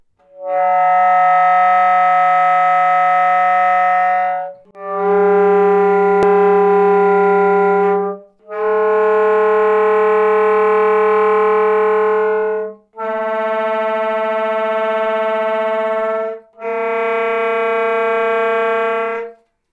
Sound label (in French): SONS MULTIPLES ESSAI, quatuor